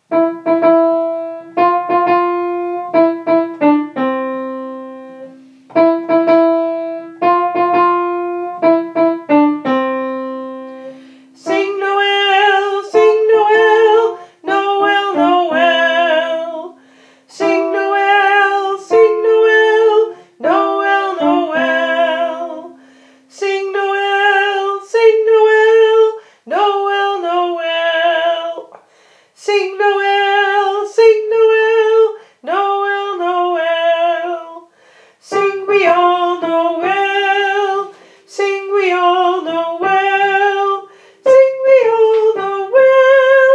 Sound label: sing noel mezzo, vrouwenkoor